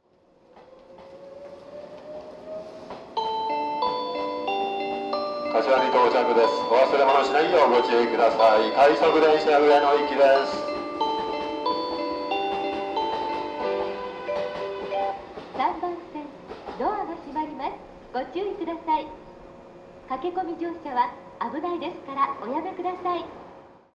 melody, station

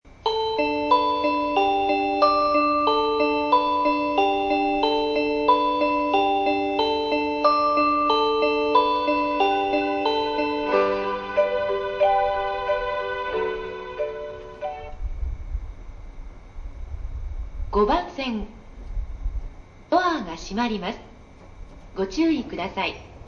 melody, station

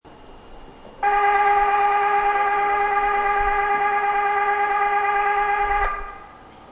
bell, osaka

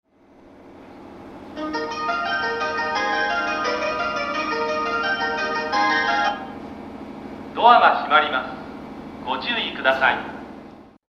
melody, station

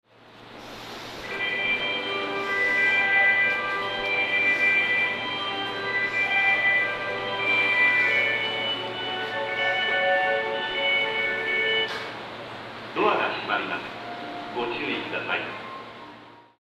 melody, station

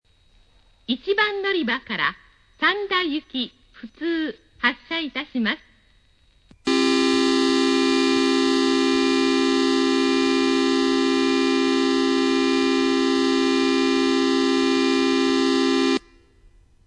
bell, station

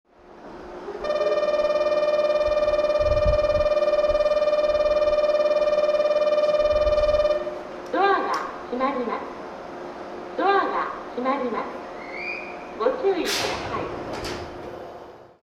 bell, station; teradacho; osakaloop; jrwest

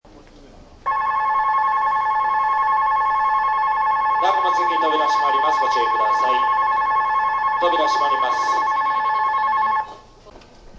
midosuji bell; osaka

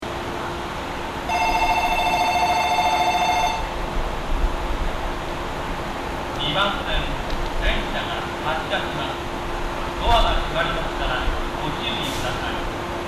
shinkiba bell; jreast

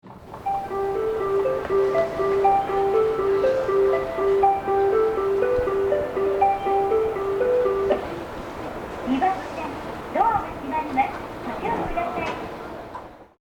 melody, yamanote; akihabara; 2番線（山手内）発車メロディ; jreast

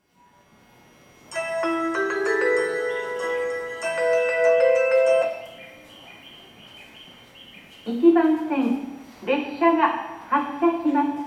melody; keiyo; kemigawahama; jreast

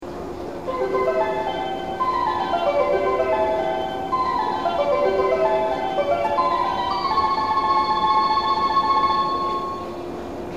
odawara 3 bell, tokaido; jreast

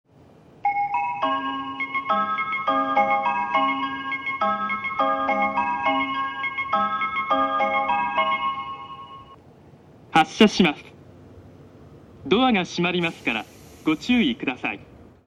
higashiomiya melody; jreast; utsunomiya

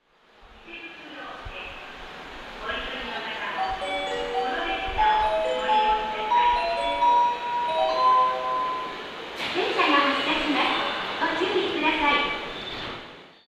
melody; 1番線発車メロディ; seibu; nerima

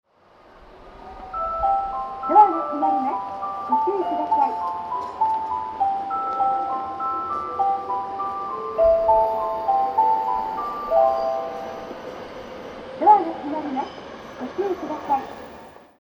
melody

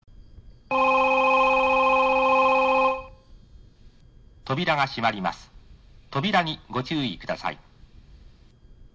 standard bell, station; hanshin

